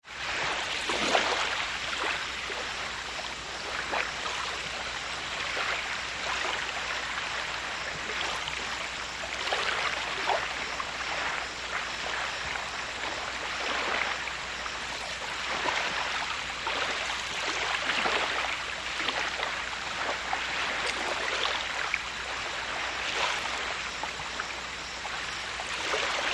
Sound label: Lht Lapping Waves CU, waves & surf